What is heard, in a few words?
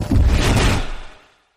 skill effect